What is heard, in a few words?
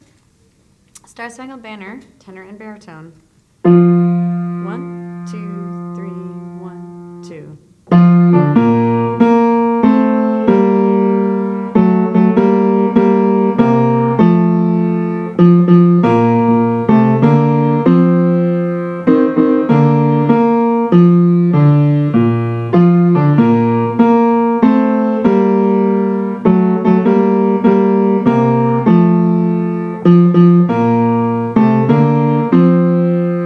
piano; Tenor Bass Piano; Rosemont Ridge Middle